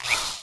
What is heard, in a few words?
frilldora attack